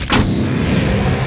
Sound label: flamevent open start; Armored Speakerman TITAN